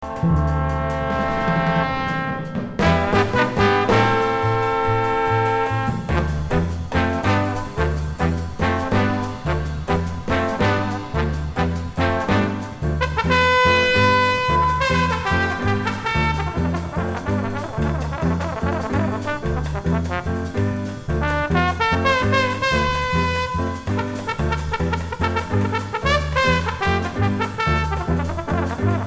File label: Sax Tenor